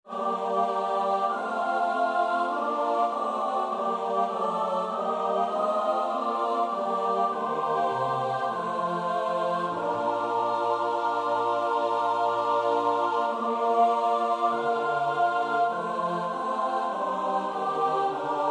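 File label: soprano alto tenor bass; Bach (Johann Sebastiaan); BWV 010; 7.Lob und Preis sei Gott dem Vater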